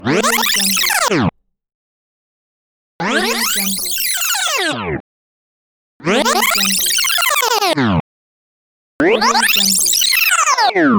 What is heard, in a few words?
tape rewind